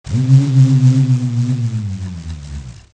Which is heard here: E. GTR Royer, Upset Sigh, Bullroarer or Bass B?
Bullroarer